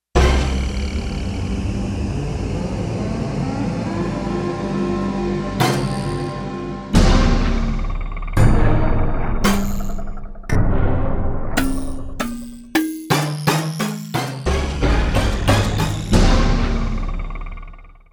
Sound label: FX Menu